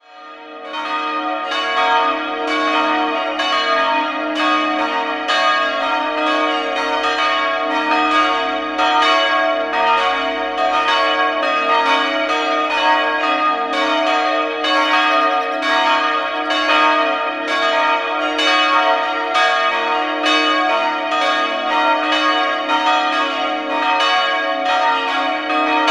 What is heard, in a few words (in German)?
Muhr am See, Glocken